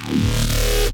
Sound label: Bass Shot; neuro; BIG5AUDIO